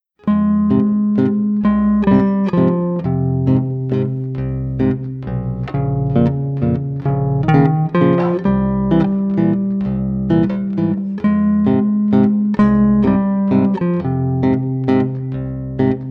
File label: Waltz for two bass guitars